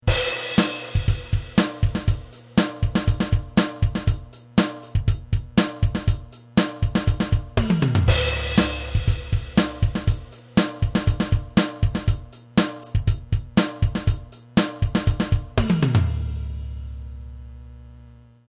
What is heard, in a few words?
real drums